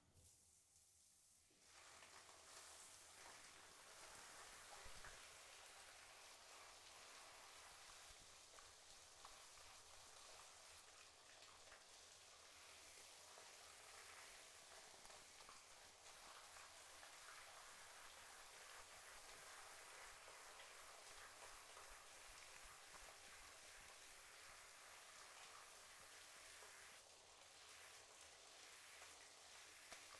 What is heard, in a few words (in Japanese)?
シャンプー